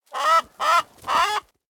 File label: attack; Rust